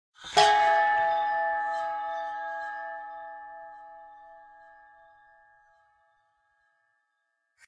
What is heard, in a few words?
Bells